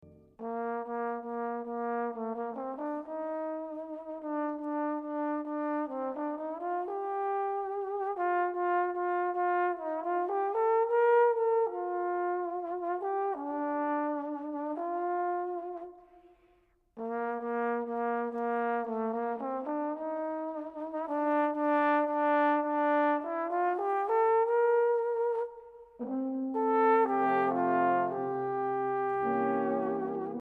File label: brass